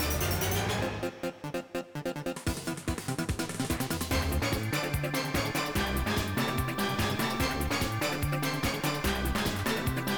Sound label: Metal Wonder Effect theme; SMBW